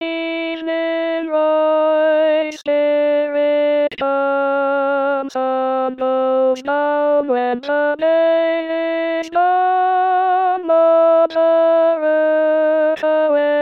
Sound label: Alto